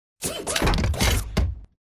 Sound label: combat idle to strafe left; Titanfall 2